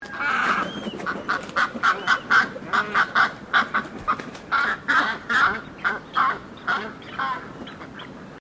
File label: Birds Ibis Australian White Mixed Honk, Birds from South Australia; Birds (Sound Effects:Animals)